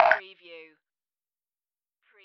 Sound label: blocky